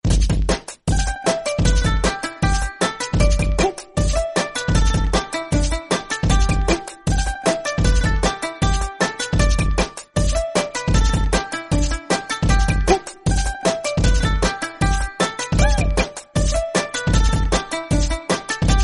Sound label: cute japan music